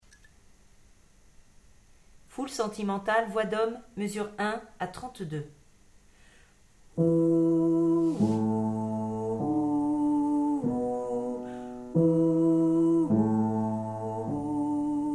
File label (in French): hommes